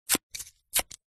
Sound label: leisure playing card rip; zapsplat